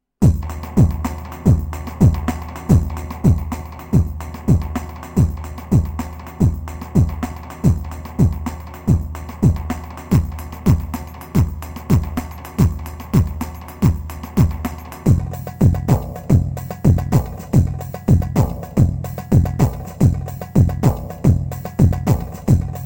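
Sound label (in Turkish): Korg PA 500 TR Giresun karşılaması; KARIŞIK RİTİMLER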